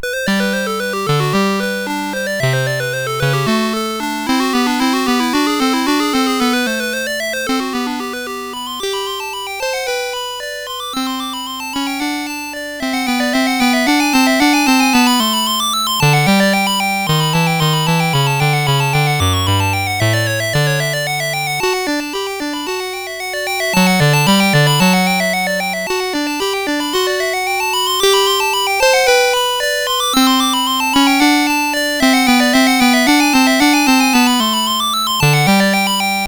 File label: Gypsy Rondo from Trio in G, CSG8580R5